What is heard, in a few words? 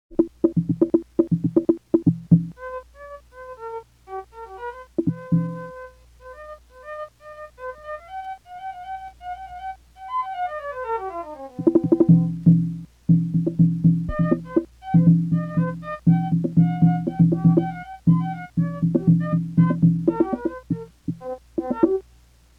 Pipes and drum